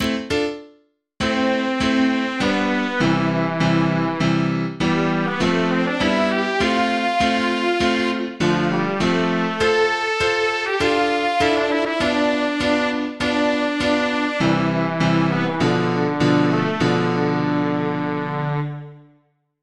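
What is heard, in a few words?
Anthem of Guadeloupe, MIDI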